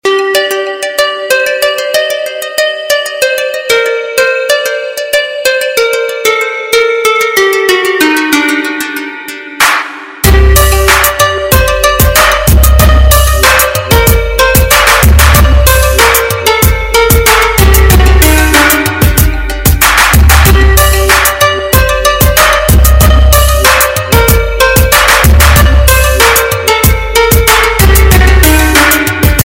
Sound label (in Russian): gromkaja muzika na zvonok telefona